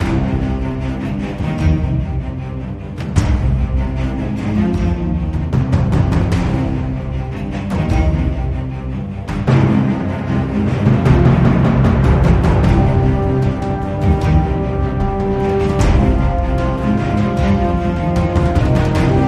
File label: Dark string trailer